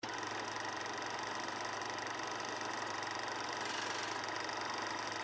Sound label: Spin; Realm Saga